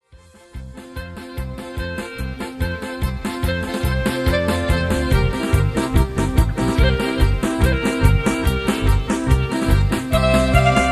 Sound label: Polka; Maus-Polka